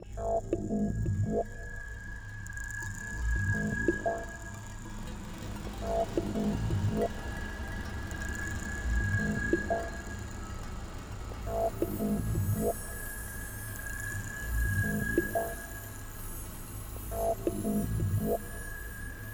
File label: Website Music Loop